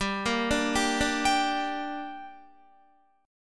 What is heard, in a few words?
G Minor G down Guitar, chord; Gm arpeggiated